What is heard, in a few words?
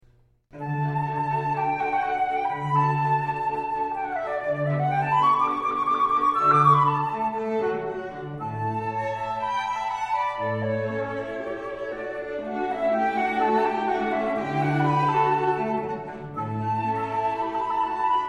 FluteQuartet in D; Mozart